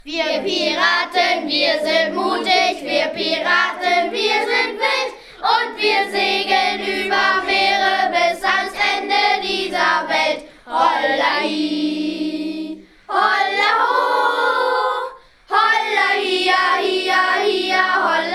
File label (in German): Piraten LIED; earsinnig hoeren 2023; doernberg